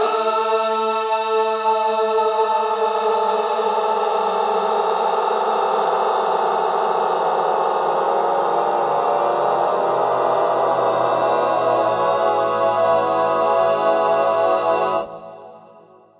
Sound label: Gliss; Jacob Durbin